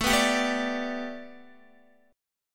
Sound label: A 7th Suspended 2nd Suspended 4th Guitar